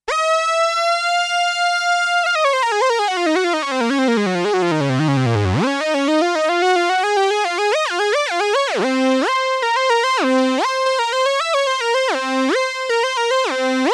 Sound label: synth solo